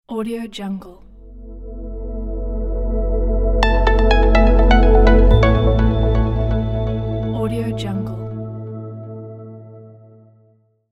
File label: clean logo